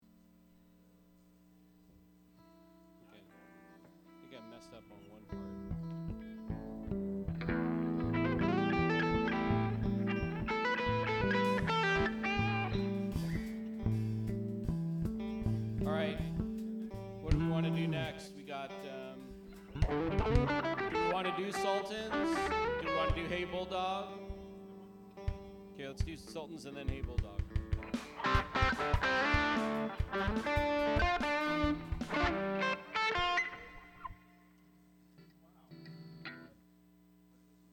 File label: Strings Mix